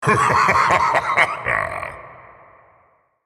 Laugh; Anno

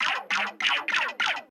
wide shot fire loop; Cuphead

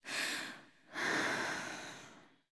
girl breathing; Aion

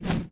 BIRD WING; Frogger 1997

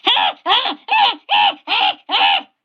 flower death scream; Cuphead

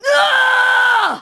Female Scream

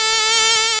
bees unused

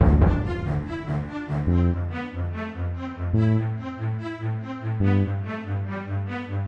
music strings; Bejeweled 3

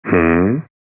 LV UP; Fire Emblem Echoes SoV; Berkut

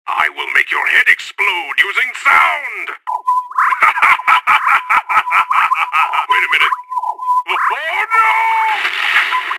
Headsplosion; Payday 2

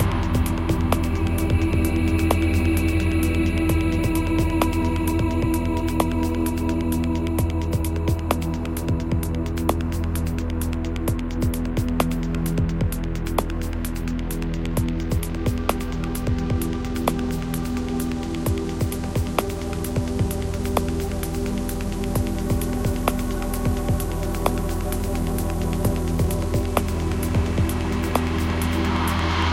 recreation; MirrorsEdge

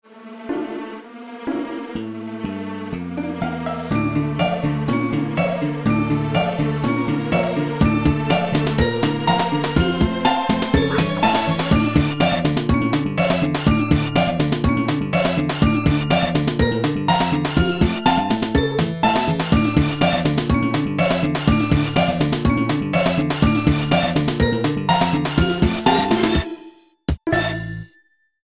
FLASHBACK; DTL Proto4; PART2 WILFRES HOUSE

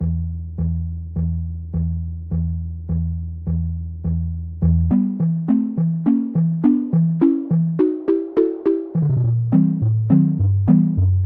Test Room Track; Crash1 Proto

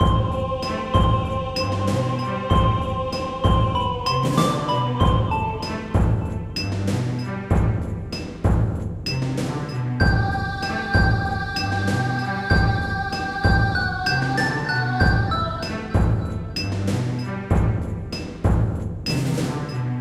music strings; Bejeweled 3

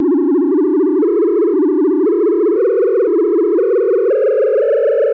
unused jingle; SNES Burn-in Test Cart; Super Scope Test

